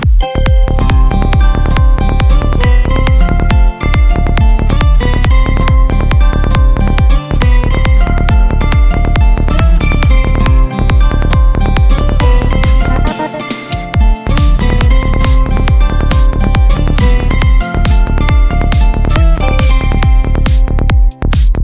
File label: lg wing 5g ringtone